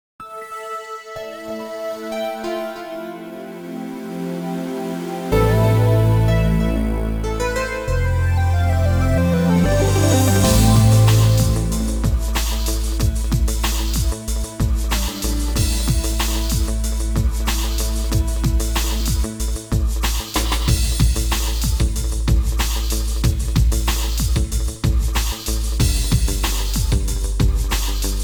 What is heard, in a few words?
Retrowave, Amiga